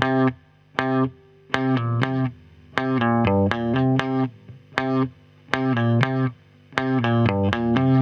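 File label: HEY guitar, Roots Disco; USB Soundscan vol.32; AKAI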